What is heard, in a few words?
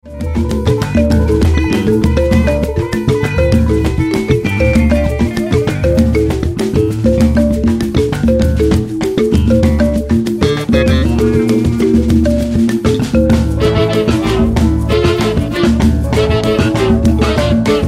Rumba Chonta